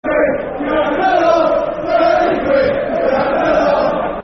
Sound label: Thirty Quid, Football Chant (Soccer Song); Watford Wfc